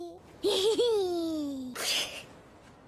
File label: baby laugh; tanjiro